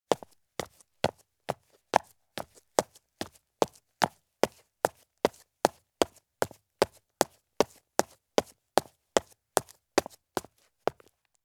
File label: Men's Light Business Shoes Take Fast Steps on Concrete Stairs; Sound Ideas; Footstep Sound Effects